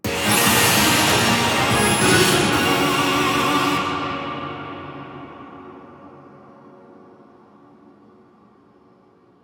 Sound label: Music Stadium Event